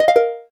gayageum, keytone

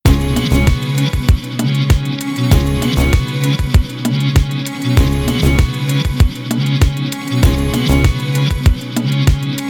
ELECTRONIC Acousta loop; Nokia; Henry Daw

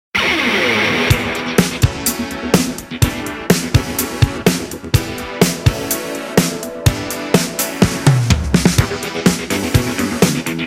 Plasma, Nokia Tones

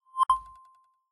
Arrow, notifications; Galaxy

